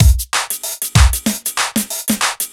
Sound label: Heavy Swing 95 C, 95bpm; off the grid